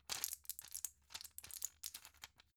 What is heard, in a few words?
plastic blister handle long